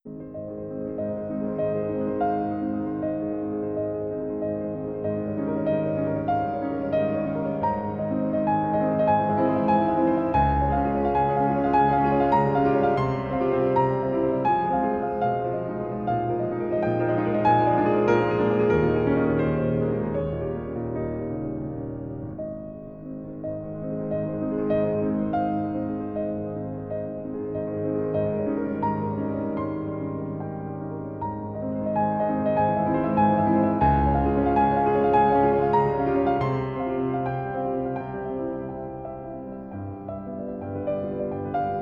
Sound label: Etudes Op.25 No.1 in A-flat major